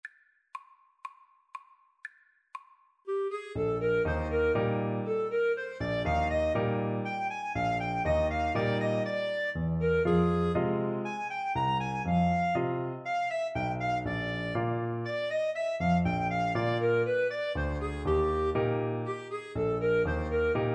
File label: Clarinet-Saxophone Duet